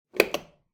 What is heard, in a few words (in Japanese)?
和風 電気を点ける音; light